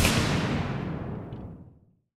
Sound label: explosion canon; cannon fire